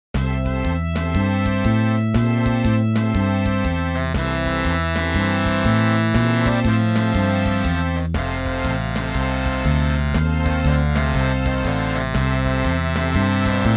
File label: MIDI blues